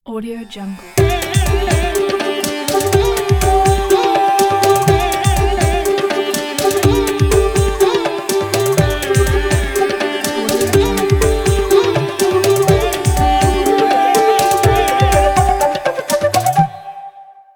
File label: indian logo